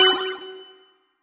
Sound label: Add Stock; Pac-Man Championship Edition 2 Plus